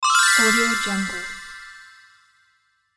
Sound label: magic